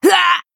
Female Night Elf Death

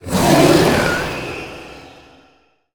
Psyfiend Attack

